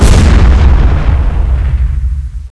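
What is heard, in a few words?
large, impact explosion; SINDEMO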